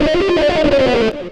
xtra lead

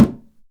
EMELABO HITS; Best Service; World Colours

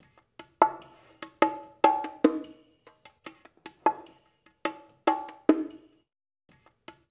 BONGO DRY; Spectrasonics; Liquid Grooves 1 & 2; SMOOTHSTX

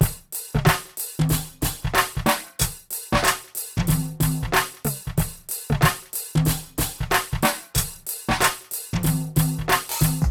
PHATT BEA; E-MU Formula 4000 Series Vol. 1; Hip Hop Nation; PhattBeats91-94

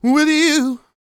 SING, Vocal Planet R&B & Dance; ILIO

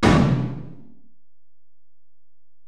Tom, Alesis DM-PRO, Drum Machines, Toms

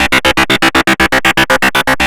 GRUNGE

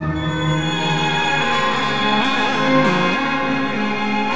STRINGS, Underground Hip Hop; USB Soundscan vol.02; AKAI

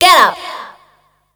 PHRASE, Voice Spectral

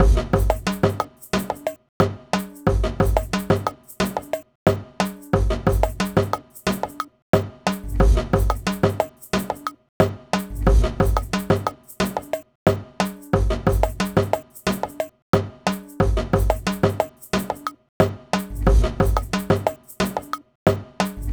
World Kit, Percussion Combos; M-Audio; Pro Sessions VOL-38; Abstract World Fusion 2

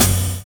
SUSPENS; Univers Sons

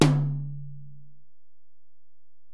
Tom, Alesis DM-PRO; Toms

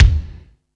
Dance, Drum Machines

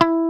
E3, Jazz Gtr Plus

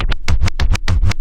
tribal scratch, Vinyl Scratches

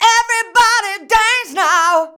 VOX, Singin

